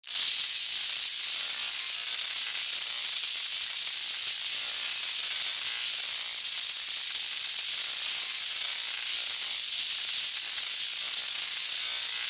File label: Electrical Discharges With Sparking Steady